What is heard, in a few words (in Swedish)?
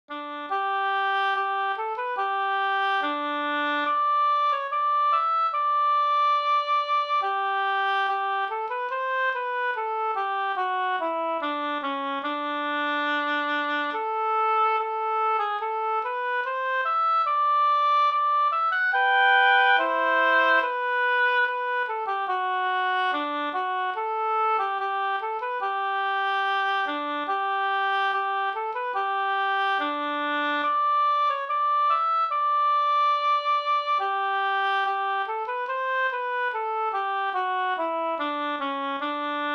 brudmarsch; av Spel-Arvid